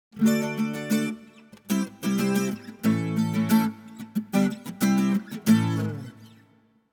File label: Acoustic Guitar - Happy Rhythm; Hollywood Edge; sound designer's toolkit v2